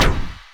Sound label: weapon fire